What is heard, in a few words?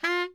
BARI FF F 3, saxophones; Greytsounds - Philip Wolfe David Crozier's Saxophones; BARI SHORTS